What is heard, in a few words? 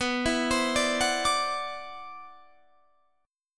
C-Major Sharp 11th B, guitar